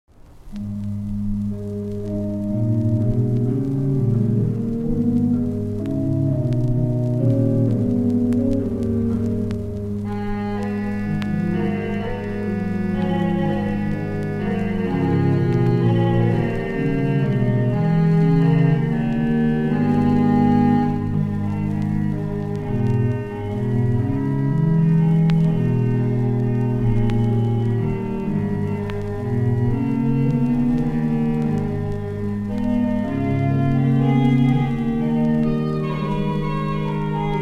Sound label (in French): Voix Humaine, orgue